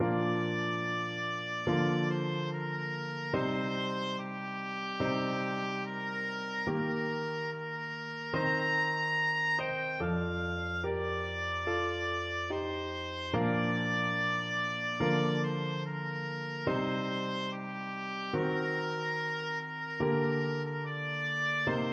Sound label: deep river, oboe